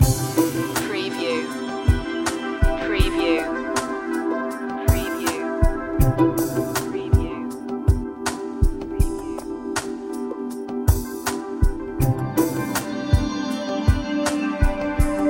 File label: intense loop